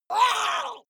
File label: water monster female gargle pain short; comedy-cartoon; soundpool